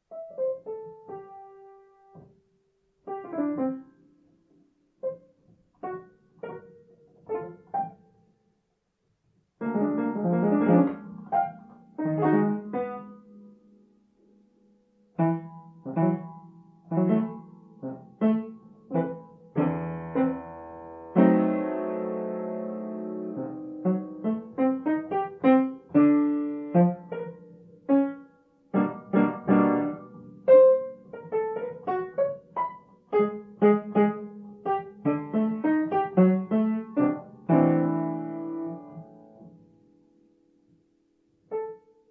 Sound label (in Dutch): improvisatie piano